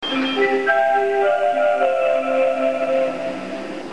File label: bus chime; 東急バス